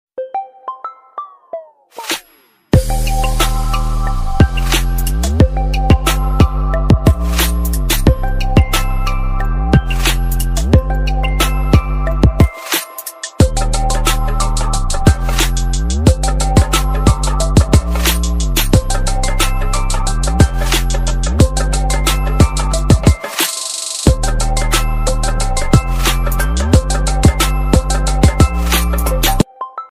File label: OnePlus 9R 5G Original